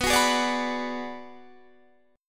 B Minor 6th B Guitar Drop D, chord

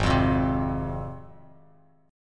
Ab 6th down Bass Standard; strummed; JGuitar

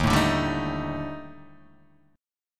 Eb Major 7th Flat 5th, Guitar Open D; 1,0,0,1,0,1; down

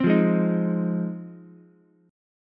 G sharp 6th, Ukulele; strummed; JGuitar

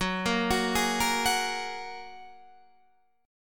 Gb Major Add 9th, down, Guitar, DADGAD

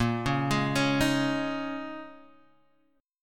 Bb Minor 11th Bb down Guitar Half Step Down

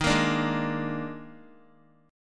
Eb Major 7th Suspended 4th, Guitar; strummed; x,6,8,7,8,x; EbM7sus4

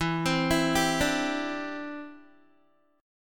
E Minor 7th Sharp 5th, Guitar; Em7#5; arpeggiated; 12,15,14,12,14,x

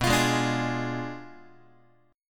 B Diminished 7th B down Guitar; Bdim7 strummed; x,7,8,6,6,7

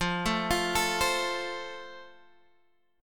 F Major, Guitar Standard; arpeggiated

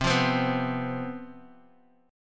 C Major 7th Suspended 4th, down, Guitar, DADGAD

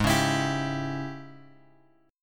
G sharp Major 7th Suspended 4th Sharp 5th G sharp; G#M7sus4#5 Chord {4 4 X X 5 3} strummed

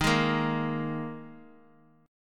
D sharp Suspended 4th; strummed; JGuitar

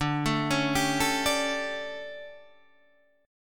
D Major 7th Suspended 4th, Guitar, Standard; DM7sus4; arpeggiated; JGuitar

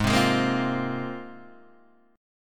Ab 6th Add 9th Ab, Guitar, DADGAD

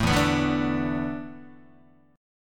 E 7th Suspended 4th, Guitar; E7sus4 strummed; JGuitar

